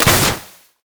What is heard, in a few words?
minefield; LoL; ziggs poolparty